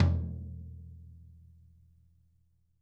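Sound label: floor tom; 90s sample CD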